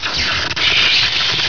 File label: death; Hover